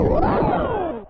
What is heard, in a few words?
giant arm action, machine